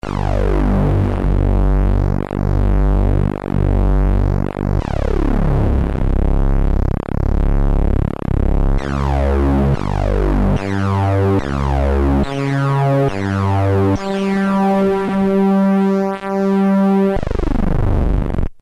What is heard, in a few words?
notch pwm; droid3